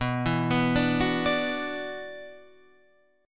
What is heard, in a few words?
E 7th Suspended 2nd, B, chord